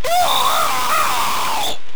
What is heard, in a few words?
Fire Scream; usmc